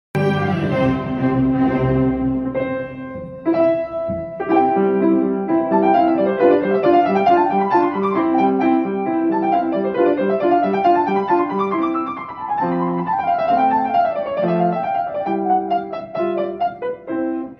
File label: Mozart, Concerto no.21 in C major, played by age 9